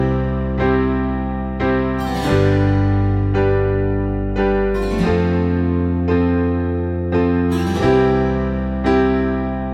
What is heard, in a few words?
Nice Guitar Music Ringtone; Oppo